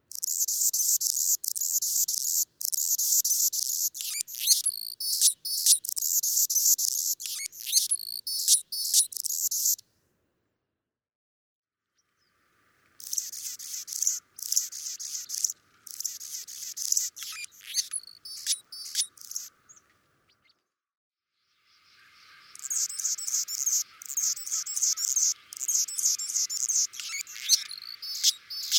Anna's Hummingbird

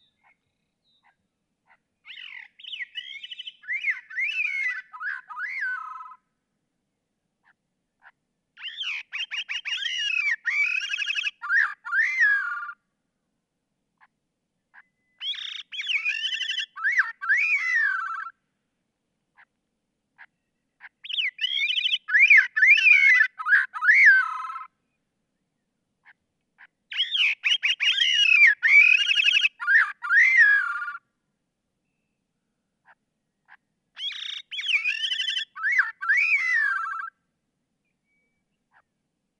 Gray-cheeked Thrush

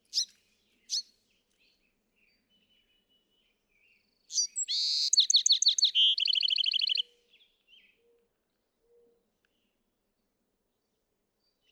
Bewick's Wren

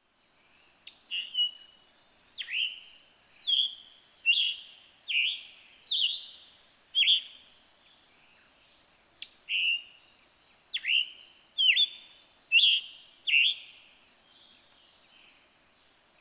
Scarlet Tanager

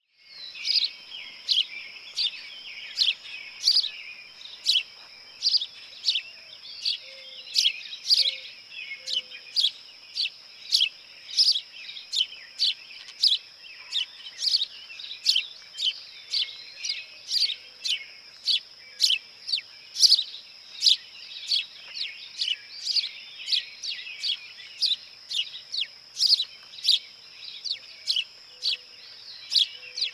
House Sparrow

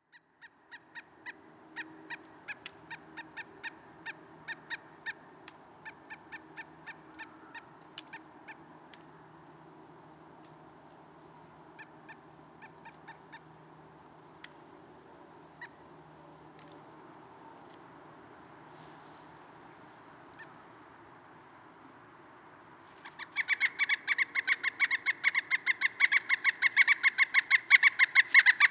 Pileated Woodpecker

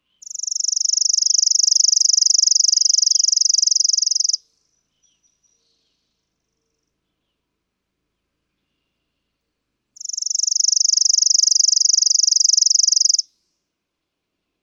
Chipping Sparrow

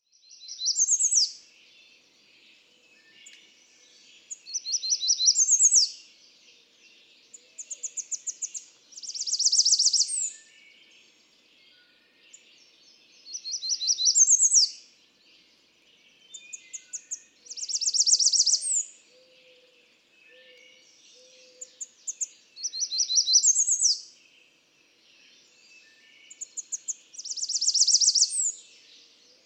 American Redstart

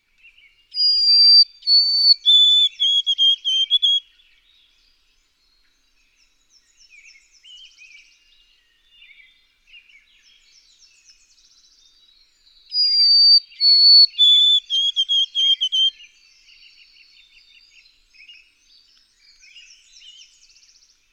White-throated Sparrow

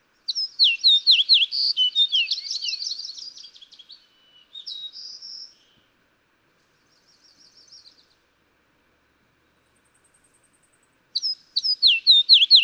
Fox Sparrow

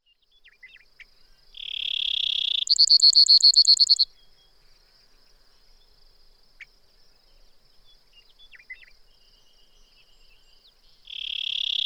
Brewer's Sparrow

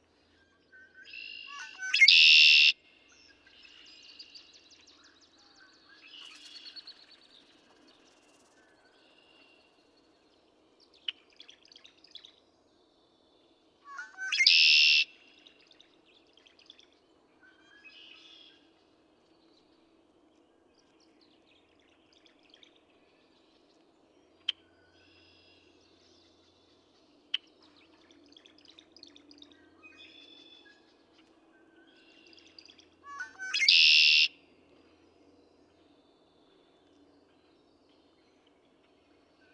Red-winged Blackbird

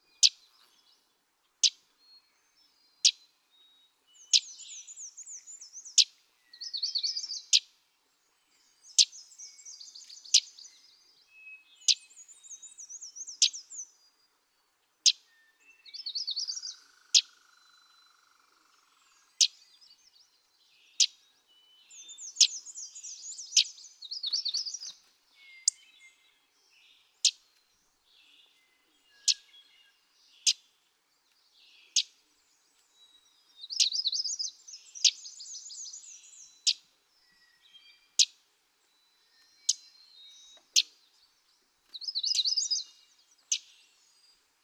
Common Yellowthroat

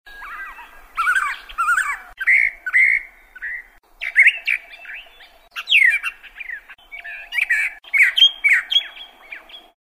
Brown Thrasher

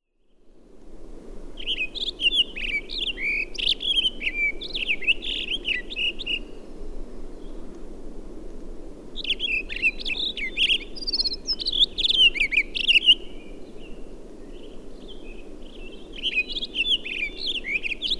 Townsend's Solitaire

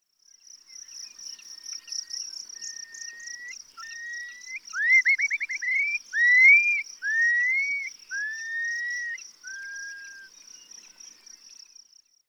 European Starling

